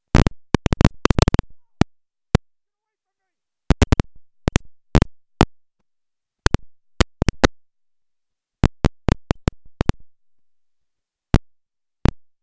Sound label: Microphone Popping